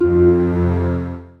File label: Musician Rebloom Bass